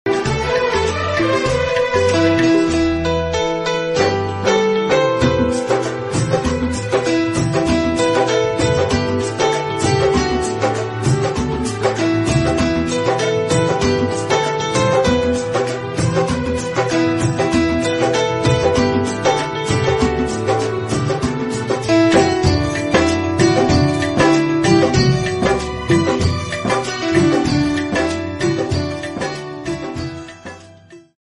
best hindi ringtone love ringtone bollywood songs ringtone